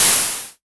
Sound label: hat, Moog Minimoog Drums